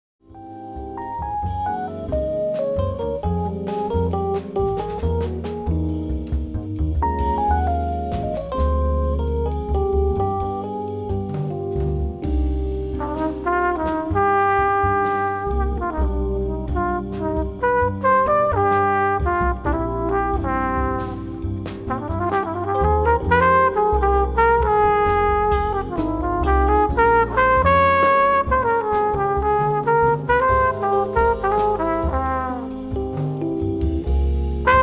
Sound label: quintet; quintet west regensommer